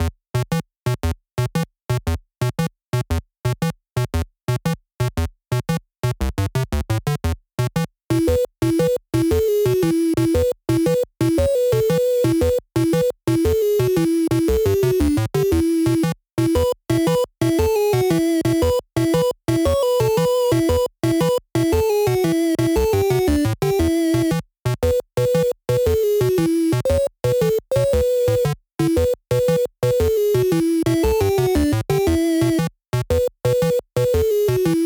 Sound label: Medieval, chillout